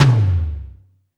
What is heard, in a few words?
OMEGA LO, TOMS; Back In Time Records; Big Bang